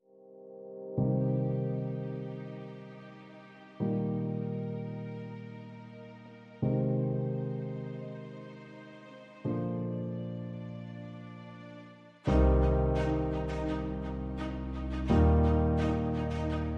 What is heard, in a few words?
No Drums No SFX